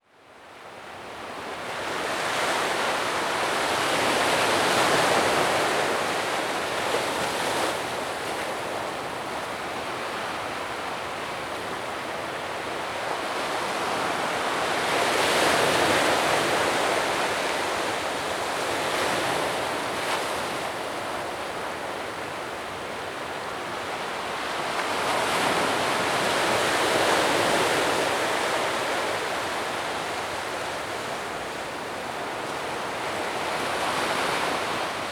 Big Ocean s Breath

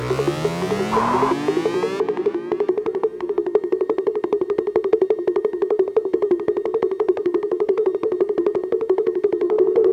Instrumental

